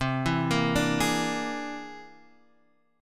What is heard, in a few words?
C Augmented 9th, Guitar, Half Step Down